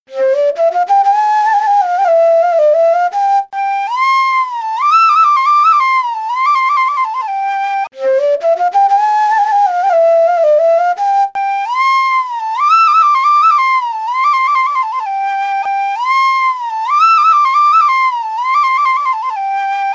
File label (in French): flute